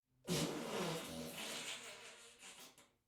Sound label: fart long through door